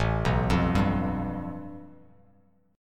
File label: A 7th Suspended 2nd Sharp 5th A Bass Standard; A7sus2#5 arpeggiated; JGuitar